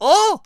Grunt Cartoon

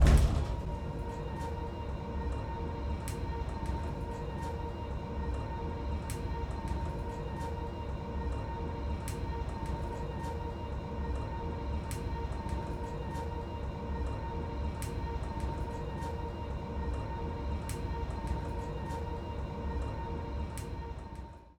Elevator Moving; unsighted

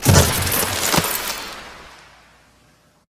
Ice Platform Destroy

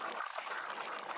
Exploding Zombie Charging Loop

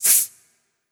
Snake

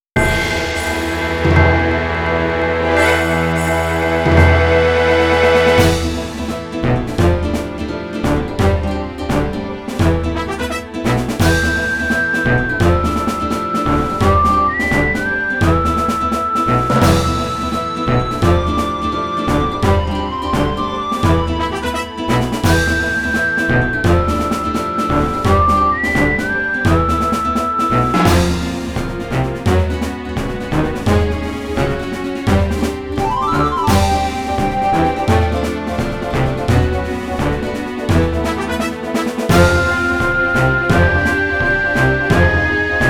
Intense Battle; the legend of zelda the wind waker hd

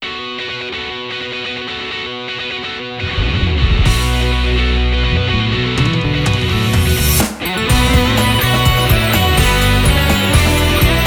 HANABI (Fireworks); Corpse Party: Sweet Sachiko's Hysteric Birthday Bash

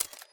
Camera Broken Shutter

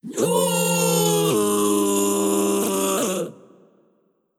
Mirro Scream

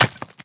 Stone Arrow Hit

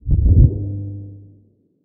Haptic Teleport transport

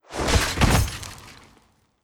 Godess Fall

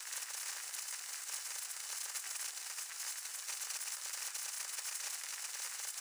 Ruin Flying Sentry Fly Loop

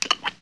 eating chew; MiniGames

